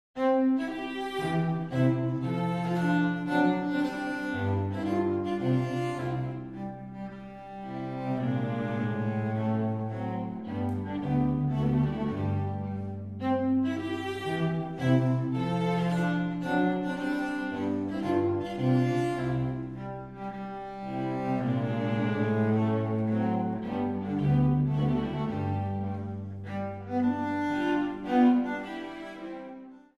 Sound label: Menuet